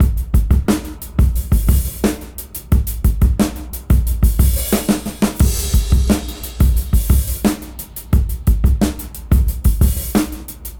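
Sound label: Fat Break 89 BPM Reverse; AMG - Keith LeBlanc Phat Butt Jams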